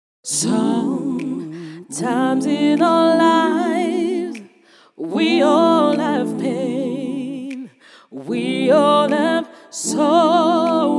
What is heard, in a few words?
acappella, gospel